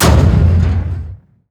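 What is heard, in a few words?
explosion asteroid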